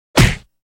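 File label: Punch Beefy Hit; Смачный удар; Звуки удара; Звуки удара для Форсакен; Punch Kit Beefy Hit 1 (SFX)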